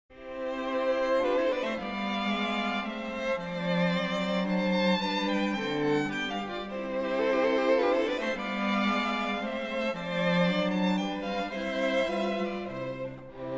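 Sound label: String Quartet in C major; Beethoven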